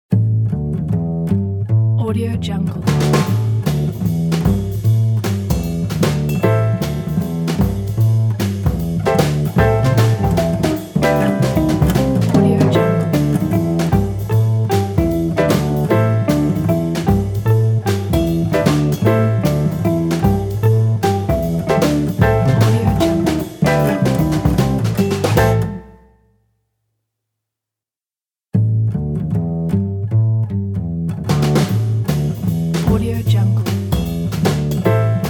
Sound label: evening traffic flow ident